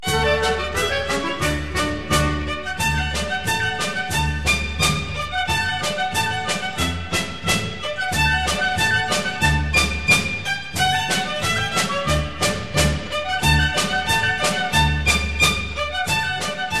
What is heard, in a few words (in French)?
Maclotte liegeoise, Belgique